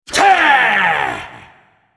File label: spell cast effort; Malzahar